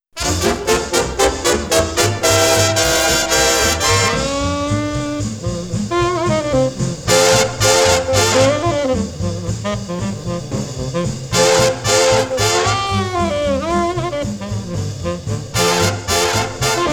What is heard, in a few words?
Riff Interlude; Classic Columbia, Okeh and Vocalion; jazz